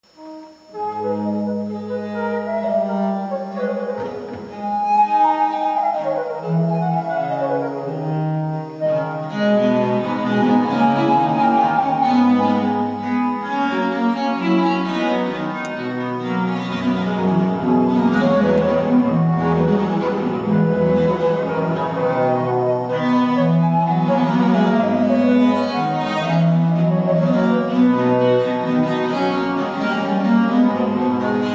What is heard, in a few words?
sonate vivace; boismortier